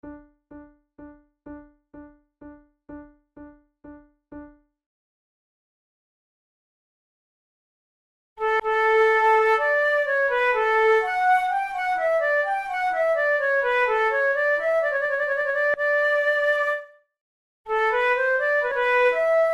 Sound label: courante